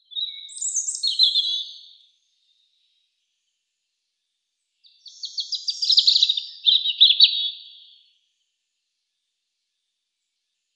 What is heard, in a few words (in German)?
Rotkehlchen Erithacus rubecula